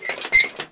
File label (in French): vanne